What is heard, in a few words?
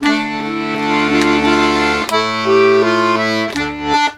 accordions; USB Soundscan vol.40; AKAI